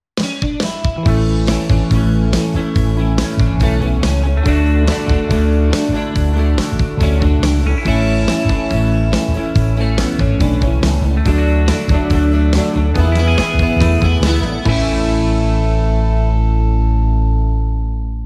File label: JINGLE POP